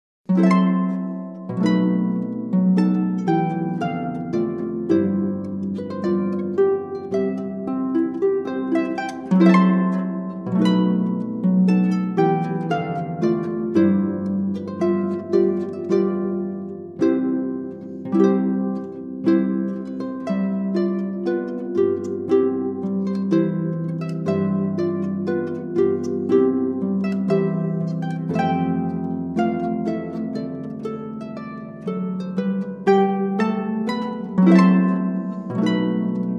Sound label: harp ceremony; Wedding